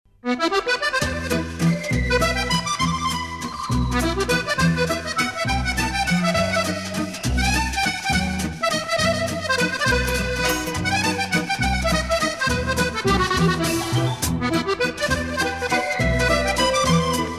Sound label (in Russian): Французкий аккордион